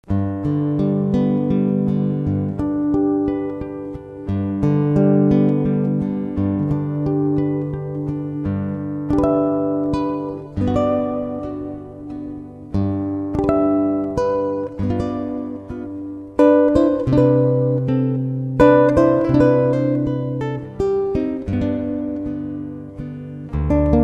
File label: Lullaby; Smoky Mt